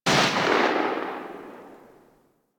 Spark Rifle Shot End; Grineer

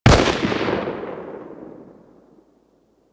Weapon Enemy Tail Outdoor; Warframe; Weapons

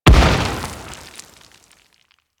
Teralyst Close Step; Enemies; Steps; Warframe; Eidolon

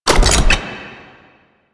Hack Minigame Mech Action D

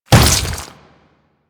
Step Flesh, Footsteps; Warframe; Grineer

